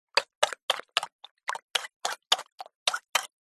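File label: cereal in bowl with milk spoon stirs stabs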